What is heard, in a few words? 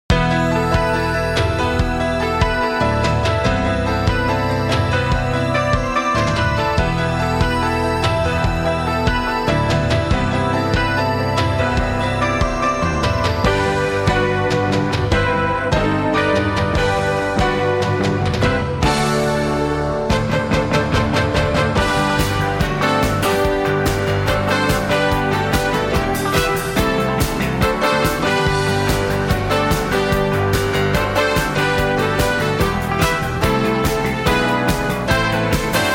opening theme; season 4; blood brothers version